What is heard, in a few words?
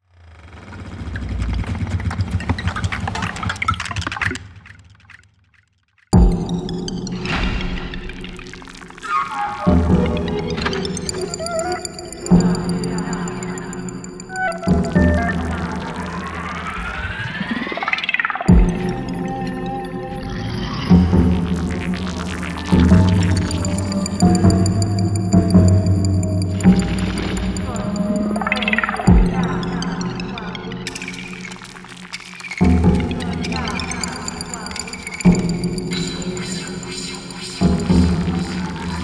High Ambient Soundscape; Formosa